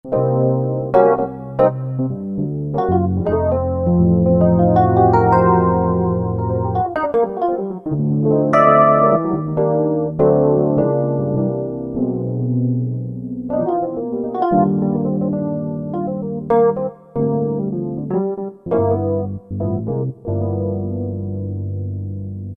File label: Dyno Roads, Korg N364; Factory Prog demos